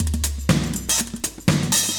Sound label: 120bpm loops; Sound Effect Library